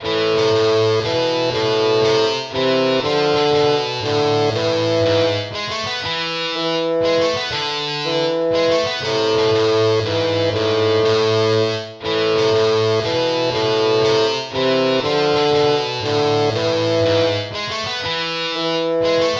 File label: baroque minuet minor